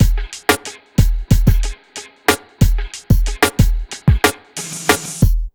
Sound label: BEAT, 92, Hip Hop R n B; USB Soundscan vol.19; AKAI; TIMBA